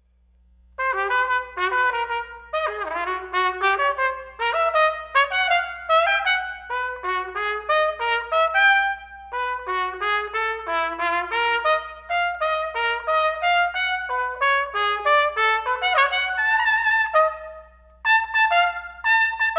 trumpet

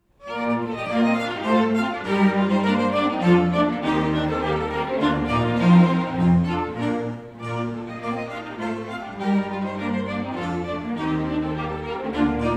hornpipe

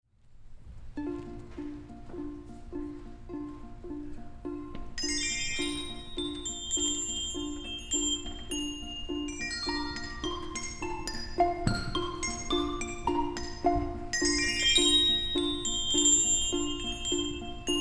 chinese dance

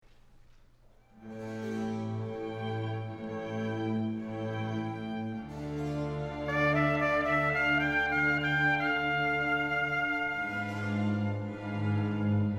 concerto for two trumpets largo

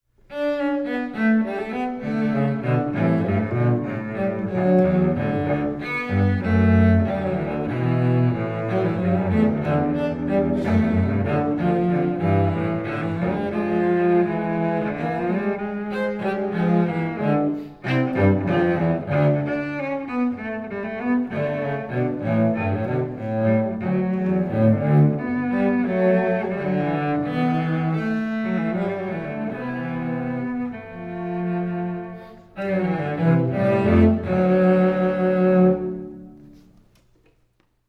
five duos for two cellos poco allegro

